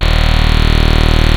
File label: TECHNO SPLIT, ENSONIQ ESQ-1; Rubber Chicken Software Sample Bank